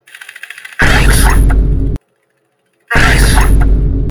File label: hiccup with vine boom; tom and his friends